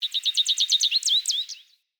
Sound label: Tree Birch Bird; Trees